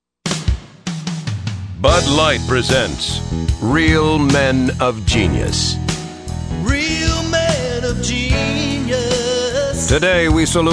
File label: dirge; dirge gas